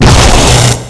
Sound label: emp